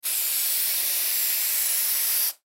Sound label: Spray Paint Can Long; Spraying; INDUSTRY; Blastwave